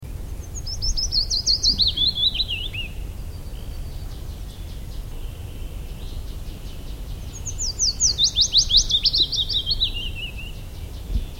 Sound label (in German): fitis